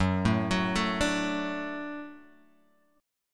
F sharp Minor Sharp 5th, Guitar Open G; chordshape; down

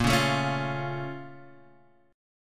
A sharp Minor Major 7th, down, Guitar, Standard; A#mM7 strummed; JGuitar

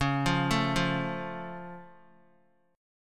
C sharp Augmented, C sharp, down, Guitar; arpeggiated

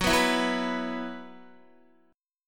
F sharp 7th Suspended 4th, down, Guitar; strummed; F#7sus4; 4E,4A,5D,5G,5C,6F

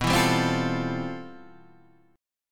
C Major 13th B

